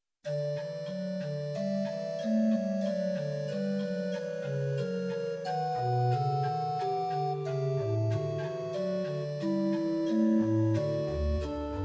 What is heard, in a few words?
Postlude, Pitch 440, Temperament Kirnberger3, RohrF8